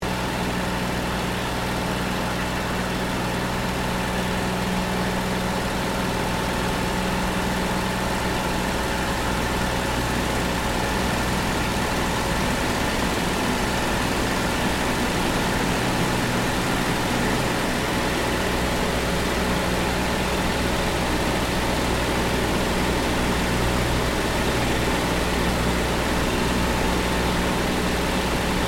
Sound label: AIRCRAFT P-40 KITTYHAWK FLYING INTERIOR CANOPY STEREO; P-40 Kittyhawk / Warhawk / Tomahawk; Airplanes